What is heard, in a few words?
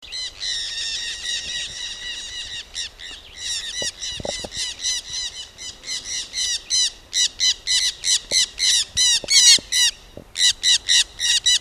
jandaya parakeet